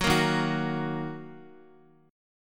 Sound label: Eb Minor Eb Guitar 4E 4A 5D 5G 5C 6F; Ebm strummed; JGuitar